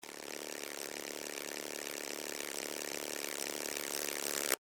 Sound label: Insect Wings Fly, Insect, Wings, Fly, By, Bug; Land; Wing Sound Effects; Wings (Sound Effects:Animals:Insects); Blastwave